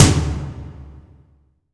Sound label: toms, cinematic impact, drum